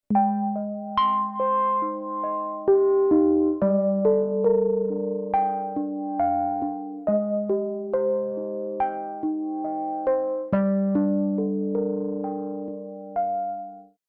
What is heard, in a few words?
Soft Ice